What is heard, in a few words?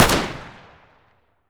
famas fire, weapons; garrysmod